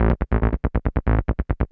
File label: SNTH BASS ELCTR 140 A, Techno